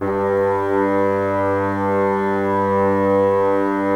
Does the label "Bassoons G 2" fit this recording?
Yes